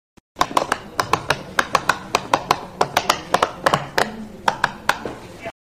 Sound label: pop it